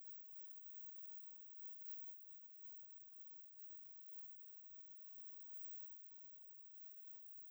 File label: single pickup, Gas Leak; Handheld Acoustic Imager